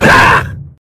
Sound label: Monk throw